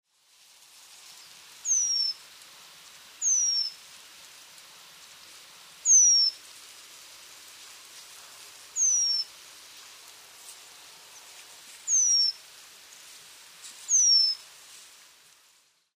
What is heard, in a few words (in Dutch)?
Buidelmees, trekroepjes; tijdens de vogeltrek